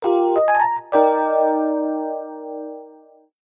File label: short rest, jingle